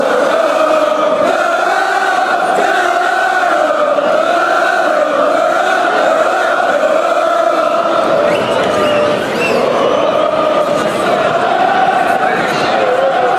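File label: chant, football fan chants; north end south end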